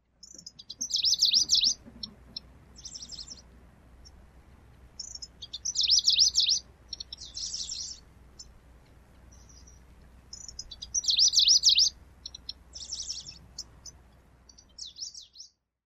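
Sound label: Pleske's Grasshopper Warbler; kashima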